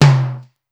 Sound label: Simmons SDS2000; SDS2000 Factory7